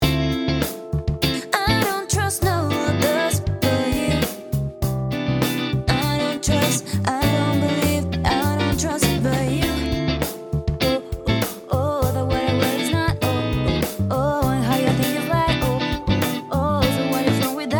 Strat

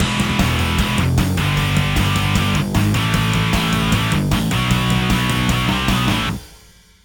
guitar on; iZotope Ozone Imager

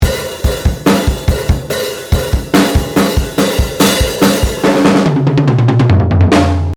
FEEDBACK; LEGEND

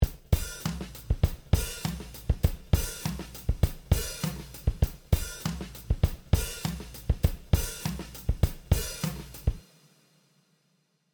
Drum; ADAPTIVERB

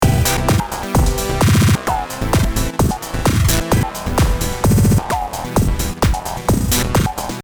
EDM Glitch

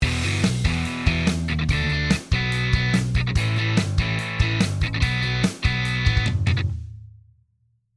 Guitar Rig

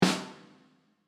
Sn bottom normal

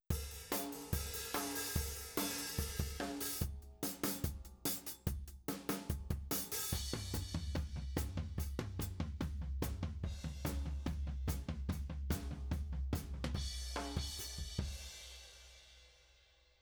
drum over

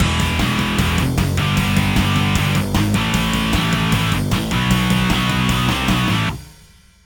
Imager guitar; iZotope Ozone

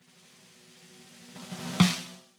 Snare roll drag

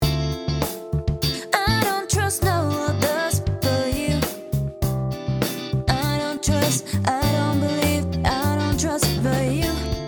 Variax LP BiasStrat

